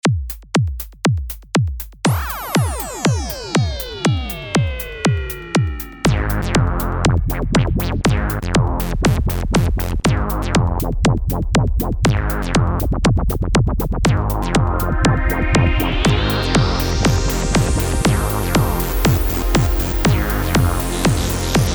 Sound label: Morph Bass; Minimonsta; gforce software